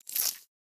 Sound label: retractor, Surgery; Shitmed; Medical